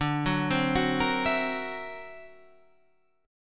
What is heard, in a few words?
D Augmented 9th Guitar Standard, chord; D+9 arpeggiated; JGuitar